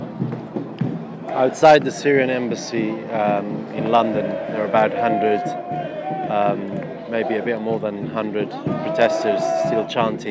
protesters still outside syrian embassy in london chanting